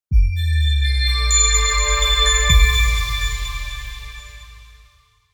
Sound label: Abstract Tech Logo Reveal; موزیک لوگو